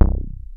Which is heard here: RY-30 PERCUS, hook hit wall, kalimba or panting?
RY-30 PERCUS